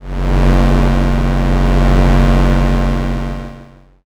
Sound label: space engine